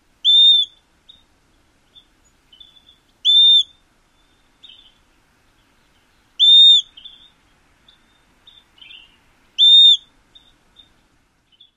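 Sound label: Greater Pewee peee call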